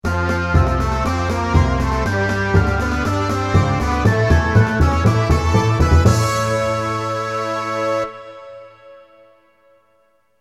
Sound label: Level Complete, PC Engine CD-ROM; r-type complete cd turbografx 16; Irem